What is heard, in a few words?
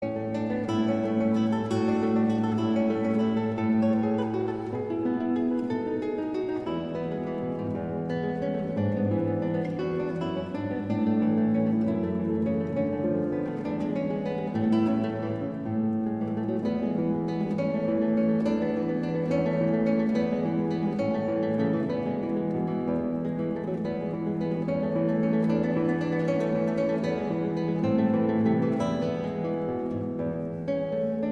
Classical, guitar